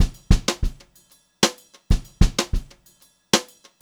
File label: BEAT, Brushed Drum Loops